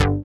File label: SYN OLD SY, dance synth; Sound & Vision - Gigapack I CD 2 (Roland)